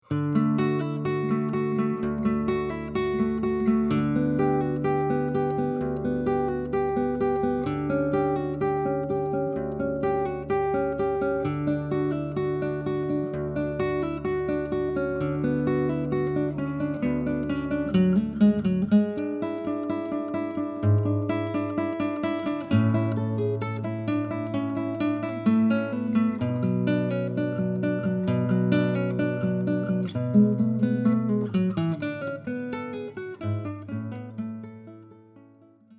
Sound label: Prelude from Cello Suite